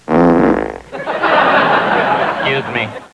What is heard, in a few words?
fart